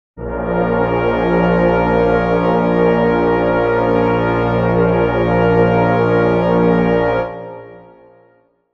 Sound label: brass chord a sharp minor